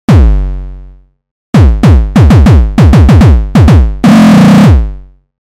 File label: Hard Kick mono